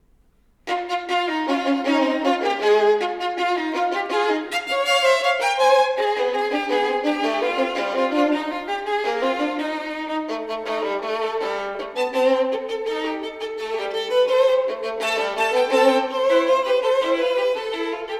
Duos for Two Violins, Counting Song

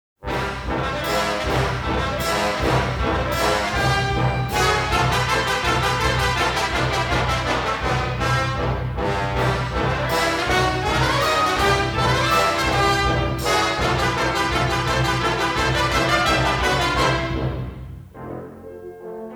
Mazurka; Prokofiev; Pushkiniana; Gennady Rozhdestvensky

